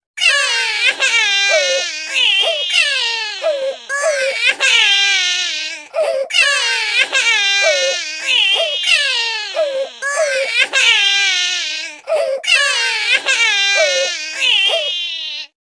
crying sound; sago mini

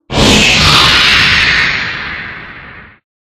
jumpscare; one night at maggie 3d

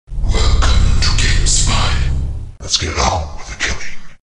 gamespy launch sounds

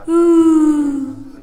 owl hoot

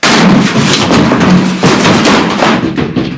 crash sound

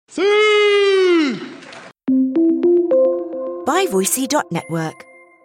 "sssiiiiiiiiiiiuuuuu"

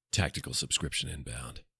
sub

